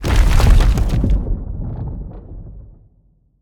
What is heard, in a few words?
Trap Crumble; Scraprun; Deathrun